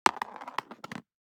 DICE on Cardboard, Throw and Roll, Standard, 1 One Die; Samples; ArticulatedSounds; DICE Sfx Party (Extended)